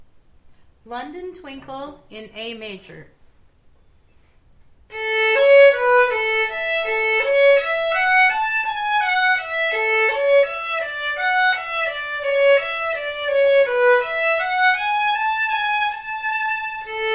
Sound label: in A